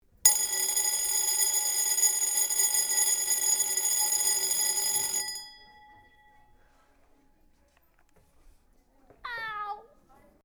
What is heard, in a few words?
Bell Just Right; Wellcome